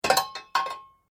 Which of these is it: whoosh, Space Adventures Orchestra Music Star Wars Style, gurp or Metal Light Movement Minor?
Metal Light Movement Minor